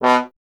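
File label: bass trombone B; Miroslav Vitous; Solo Instruments VOL-1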